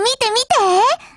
weapget rare; m4mod

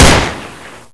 glock fire; paranoia

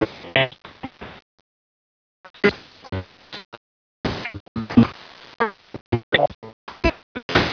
bad transmition

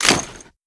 auto shotgun deploy; eva8

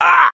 MINOR PAIN; cash

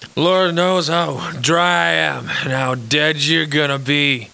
drunk

